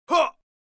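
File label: under attack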